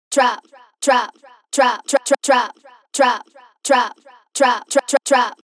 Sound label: Chant Loop 170